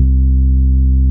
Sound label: MICRO C3, ANALOG BASS; Music Store - Claudius Diemeter Schwerlos